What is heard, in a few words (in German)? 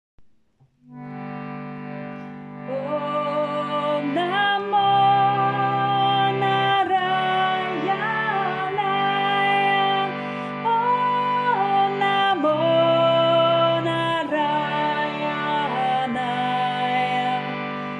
om namo narayanaya 1. Stimme